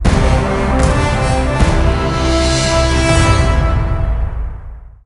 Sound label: Sting Combat Win fight; percy jackson and the olympians the lightning thief; nds